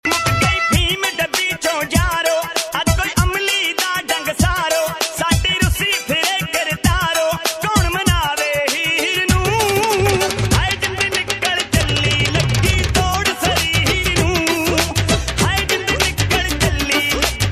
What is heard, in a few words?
Panjabi Tone